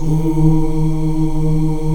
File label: AFRO OO D#3, CHOIR; Spectrasonics; Heart of Africa 1